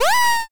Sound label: pico surprise